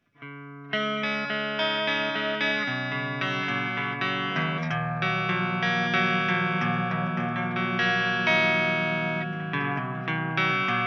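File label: arpeggio, guitar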